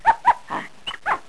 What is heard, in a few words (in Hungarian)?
majom